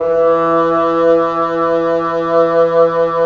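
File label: WND BN, wind bassoon; LA Composer vol. 3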